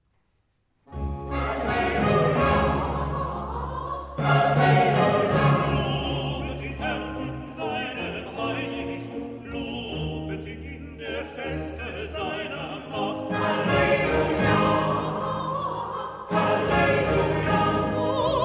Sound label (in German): Chor; Halleluja Lobet den Herrn